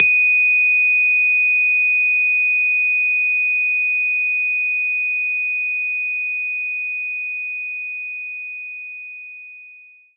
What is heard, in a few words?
Rhodes Eb6